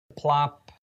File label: plop